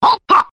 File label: hoo ha; ooh ahh; ho hoo woo